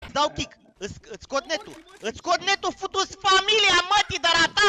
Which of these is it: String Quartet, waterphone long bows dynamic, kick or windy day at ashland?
kick